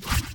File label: MOVE; C64 Dreams; Multi-Sound Default